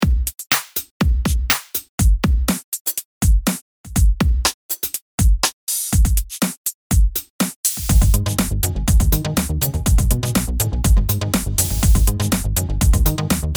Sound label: italo disco, ultrabeat; logic pro